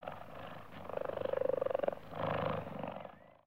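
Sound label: Sabertooth Eating; creatures; pets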